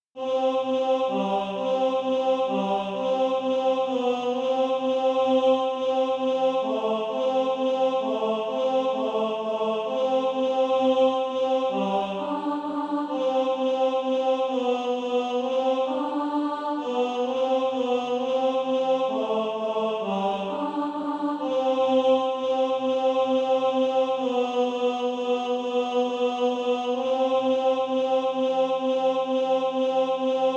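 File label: tenor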